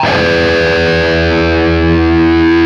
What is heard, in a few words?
LEAD E 1, Guitar; East West; Steve Stevens Guitar Samples Collection